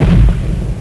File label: Skill fire bomber; FlyForInsanity